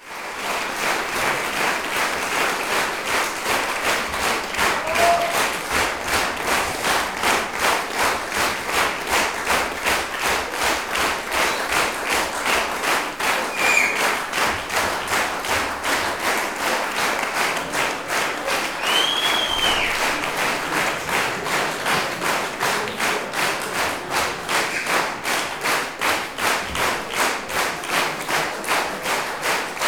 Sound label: Applause; best service Blue Box